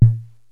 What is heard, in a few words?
YAMAHA, DRUMS PERC; Rubber Chicken Software Sample Bank